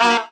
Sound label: player hit; GoldMinerJoe